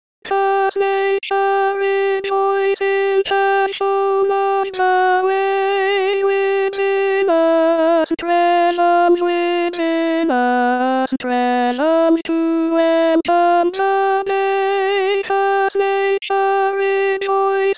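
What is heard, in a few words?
voix chorus alto; purcell; ode 1694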